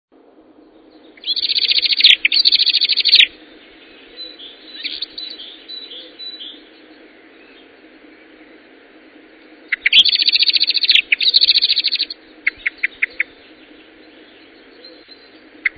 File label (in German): Amsel